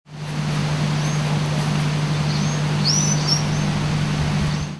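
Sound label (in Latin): Iodopleura pipra; Ubatuba; SP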